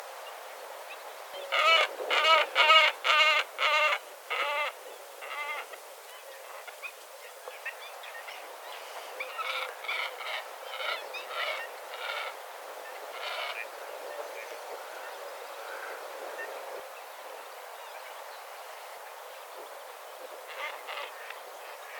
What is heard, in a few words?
glossy ibis; اکراس سیاه